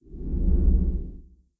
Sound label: Motor; blue archive